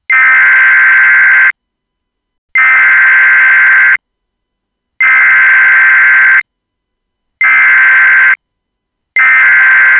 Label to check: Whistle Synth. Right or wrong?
Wrong